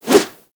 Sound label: Melee Idle Whoosh; Weapons